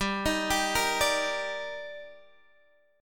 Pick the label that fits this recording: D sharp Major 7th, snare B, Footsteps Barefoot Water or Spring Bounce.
D sharp Major 7th